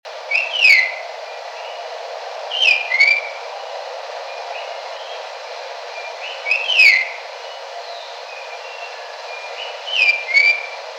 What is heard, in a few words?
redtailed palm thrush Kinshasa 2am